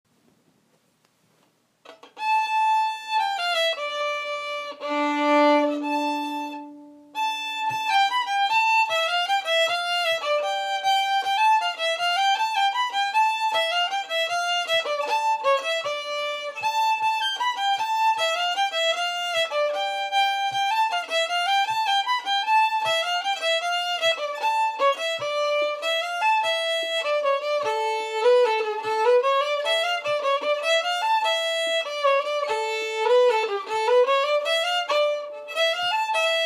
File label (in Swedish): Fiol Valsen; Lilla Ore